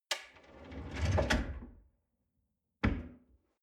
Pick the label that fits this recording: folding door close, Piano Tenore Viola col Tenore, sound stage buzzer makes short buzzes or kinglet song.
folding door close